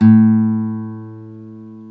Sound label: GTR Nylon, Roland